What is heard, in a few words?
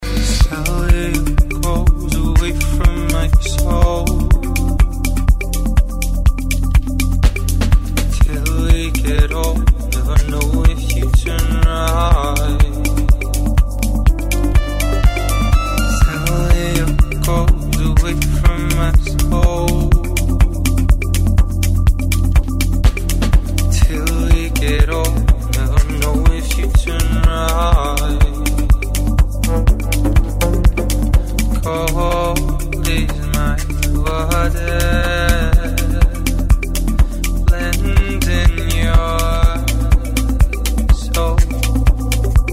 progressive melodic house